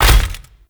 Melee Jump; Warframe; Necramech

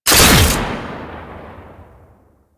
Fulmin Prime Shot, Weapons; Warframe

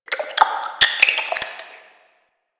Flesh Tunnel Critter, Ambience

